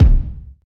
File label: subtle reverb bass drum single shot c key